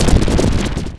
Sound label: rock burst